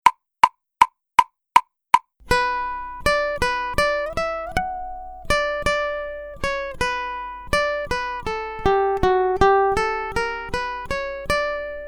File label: Accompaniment, ukulele; grade6